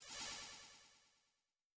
Multimedia Internet CD-Rom Flash Beep Multiple

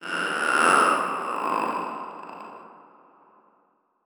Title Transition Horror Dark; Adobe; Production Element

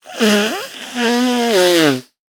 Cartoon Human Male Blowing Nose; AdobeCC

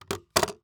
Weapon Pistol Set Down On Cluttered Table; AdobeCC

